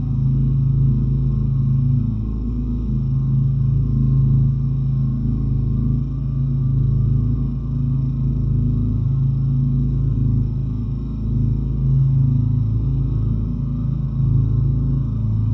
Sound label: ship idle; Stellaris